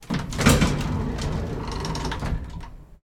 industrial machinery elevator door old open; sound effects one

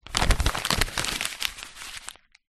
paper thick scrunch up; foley

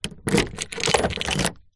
vehicles car key insert door lock lock remove; zapsplat

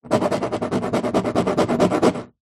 foley glass bobbled scratch fingernail; zapsplat

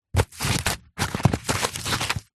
foley hat sombrero handling

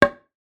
impact wood post hit; zapsplat

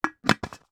plastic biscuit jar lid put on glass jar

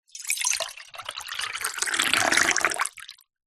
household water pour into metal camping cup

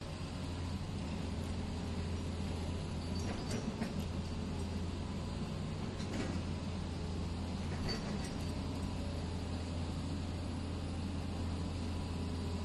excavators contruction site idles and drives; your free sounds

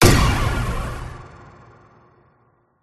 spaceship cannon impact

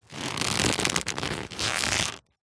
foley leather baseball glove movement creak

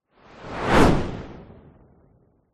whoosh fast; sound design

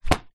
sport swimming cap latex drop carpet; zapsplat

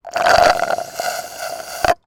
drink suck through straw empty cup; food; sound effects; sound effects one

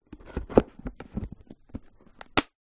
plastic food tub lid clip close; foley

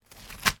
horror slit throat or flesh knife wet squelch; sound effects one

